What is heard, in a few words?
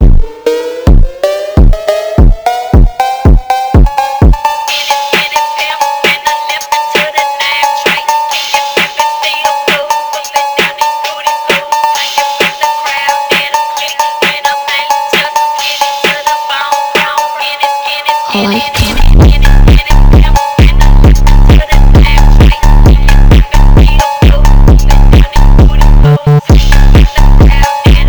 Phonk